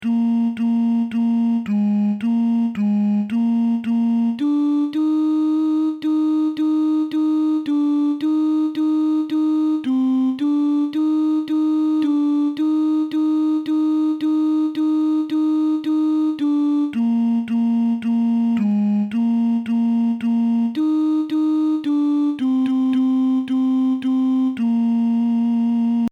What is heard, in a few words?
Lord of All Hopefulness Alto